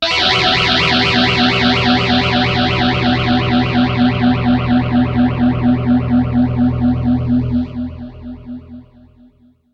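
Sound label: dirty guitar pt9pro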